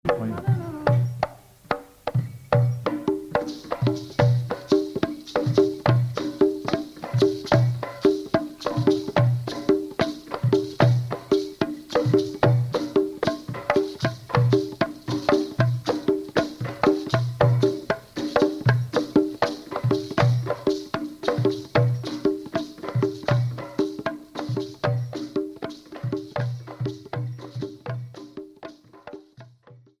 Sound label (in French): Rythmes de tambours bata a Elegua et Eshu, musique